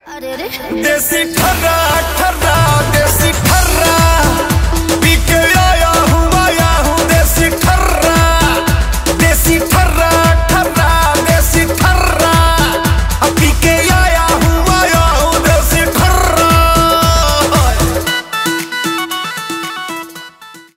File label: Desi Tharra Bhojpuri Ringtone